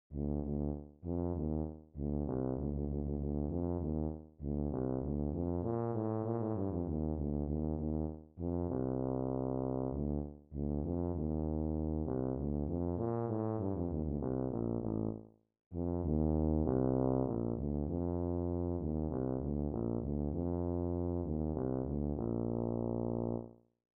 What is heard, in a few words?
Tuba fast; TUBA Techinical; Region 23 Middle School Etudes for 2026-27 (Year C)